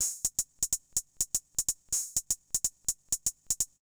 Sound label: HIHAT HOUSE 125, Drum; eJay